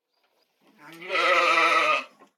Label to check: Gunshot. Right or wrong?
Wrong